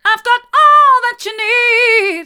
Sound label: hard, vocal